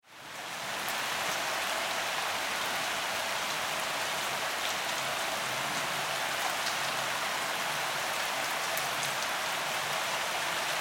Weather Rain Pour Light Splatter Ambience

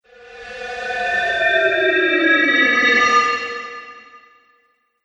Wizard - Magic Vacuum; Magic Sound Effects; Andrew Fleming

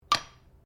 JAR GLASS LID METAL PLACE BENCH; Various kitchen jars; Set Downs; Objects

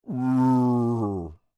Creature Speak Moan, Human; Groaning Sound Effects; Voice; Big Room Sound

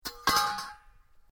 METAL OBJECT CRASH; Metal Sound Effects; Metal (Sound Effects:Materials)

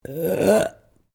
Burp female medium, Human Burping

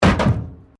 METAL BIN IMPACT PLASTIC CRASH BARRIER

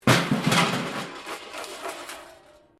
metal rubbish bin lid smash concrete

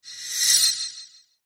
A Slice Of Magic; Magic Sound Effects; fantasy sound effect; Andrew Fleming

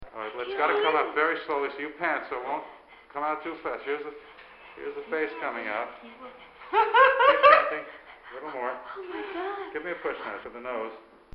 Childbirth, hospital; Hospitals

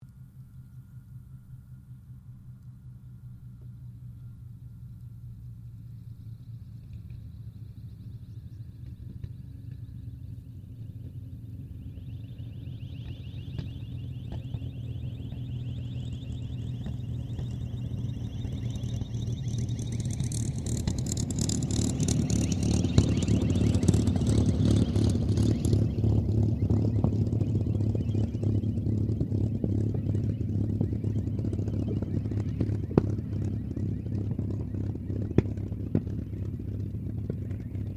AIRCRAFT CAC CA-18 MUSTANG MK23 LAND; CA-18 /P-51 Mustang; Airplanes

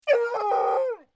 Beagle Baying; Dogs

